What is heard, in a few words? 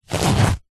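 foley clothing jeans denim fly zipper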